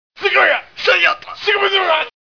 Ninja Sound

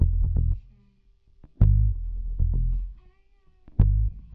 Jazz Bass

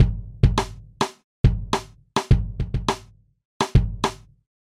Hip Hop Groove

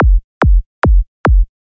Minimal House

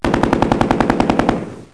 AK47 Machine Gun

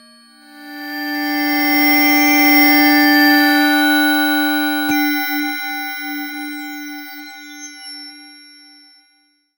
Insane Melody C5

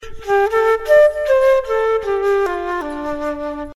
Jazz Flute Phrase

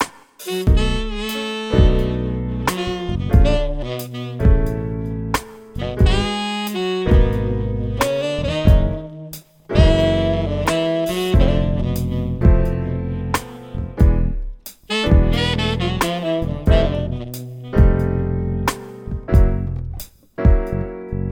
Jazz with sleaze sax

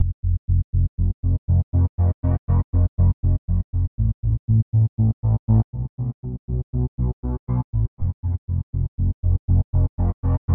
Panning Bass Pulse

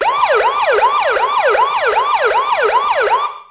Police Sirens

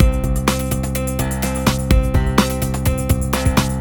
Hip Hop Movie

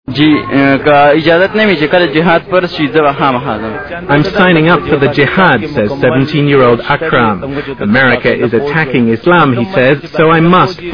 Vocal Crazy

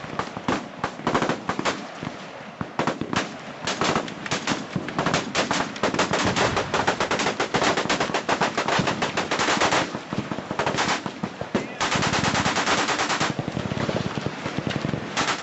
Machine Gun Fire

